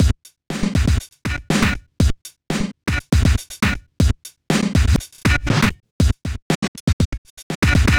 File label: Disco Punk, Beats; Sonic Foundry (Sony Creative Software)